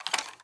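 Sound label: Inspect Movement; Valorant; shorty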